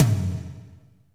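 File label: tom, real mega drums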